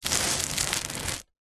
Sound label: container tub plastic heavy short slide gritty concrete